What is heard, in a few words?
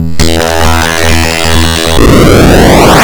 Start; Defender